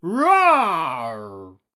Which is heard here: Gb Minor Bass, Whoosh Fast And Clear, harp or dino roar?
dino roar